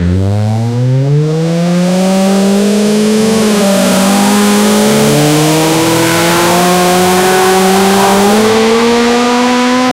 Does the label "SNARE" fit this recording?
No